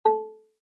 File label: lock keypress tone; nest yale lock